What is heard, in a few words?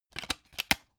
dvd case put in dvd